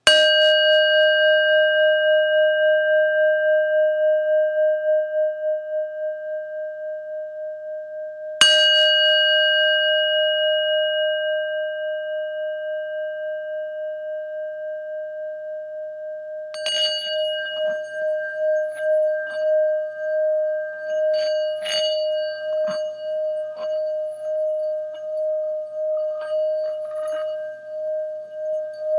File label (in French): sonido, bol chantant tibetain; thado